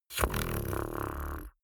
beatbox tongue roll soft

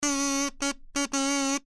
kazoo

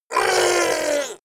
fishman shout pain hit

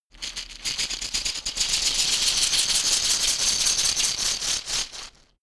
shaker wood rough rattle dynamic movement

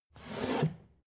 take book hard

